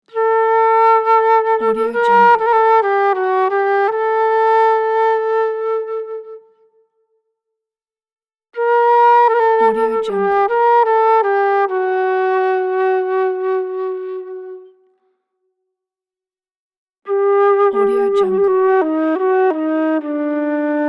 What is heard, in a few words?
desert flute